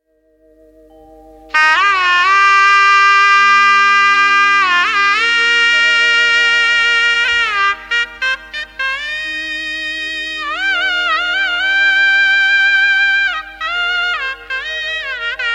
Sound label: Music; Gujarati; Dandiya